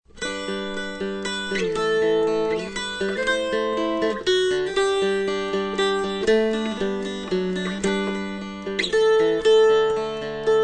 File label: Jesus The Very Thought of Thee, dulcimer; dulcimer hymnal; hyms